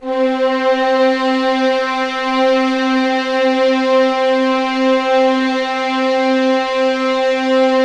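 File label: C4 legato violins; East West; The Ultimate String Collection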